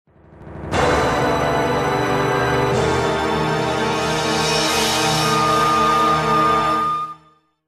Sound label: Fanfare (Game Over); Conquest